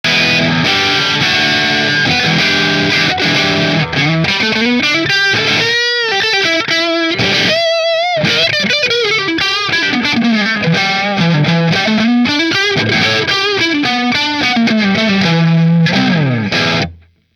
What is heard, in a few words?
Mcnaught neck marshall, guitars; McNaught Custom Guitars Vintage Single Cut Dark Blue